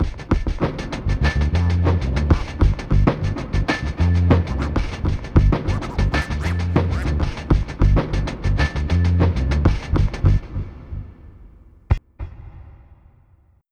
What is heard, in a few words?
Ditty; NBA Courtside 2002